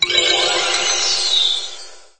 victory sound; lego star wars

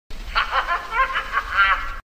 giggle; toymaker

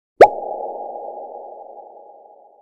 pop playful

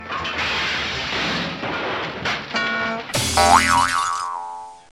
cartoon fight long

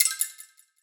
cookie clicker fortune sound

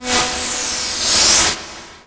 new powerup; touhou

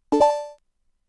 popup sfx; sonic unleashed

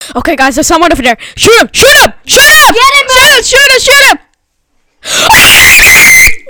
scream; fortnite

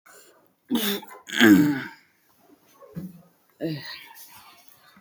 aaaahhhhhh hello

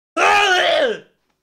aa aaa

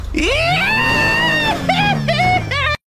screaming

